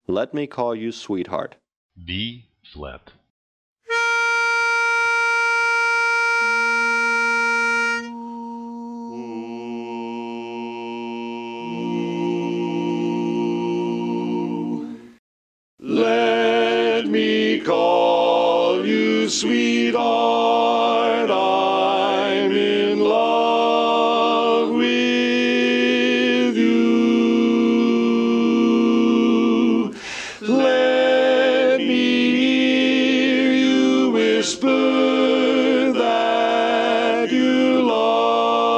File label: Bari, barbershop quartet